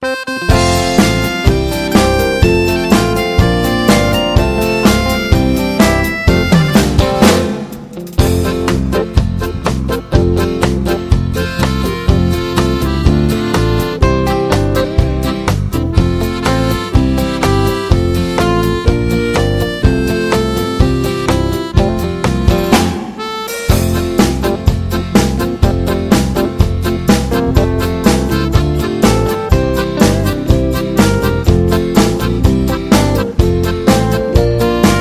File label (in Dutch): instrumentaal; Communieliedjes